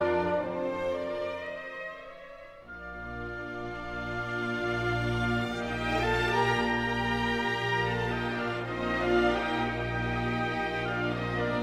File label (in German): Hornkonzert; Wolfgang Amadeus Mozart